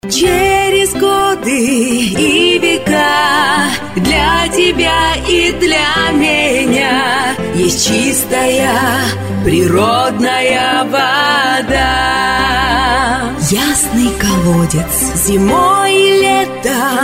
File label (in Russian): vokal